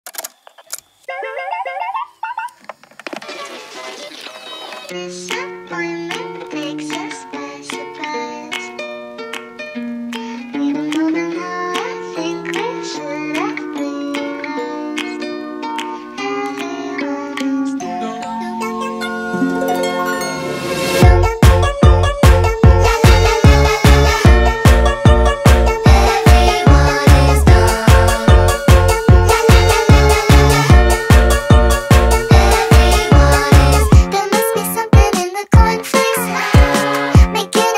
sped up 140

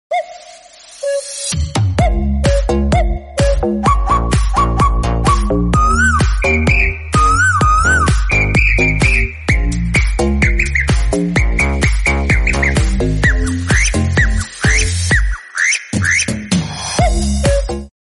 Seagull Dance Bird Phonk; meme sound effect

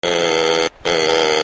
Startup; Simplex

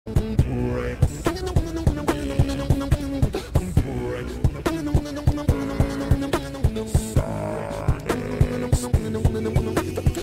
Beatbox; Meme

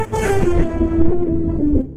Vine boom song

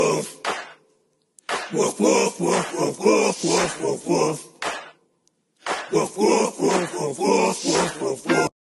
Woof Woof Woof; Meme sound effect

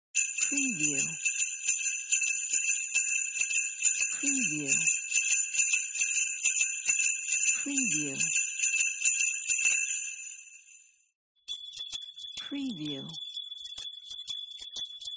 jingle bells

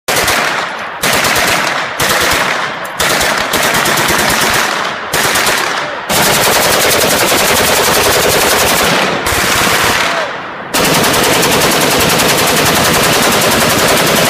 Gun

